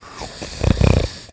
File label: Snore; Salvatore; zelda sounds